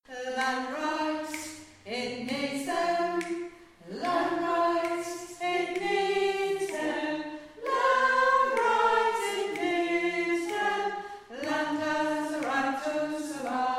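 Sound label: Soprano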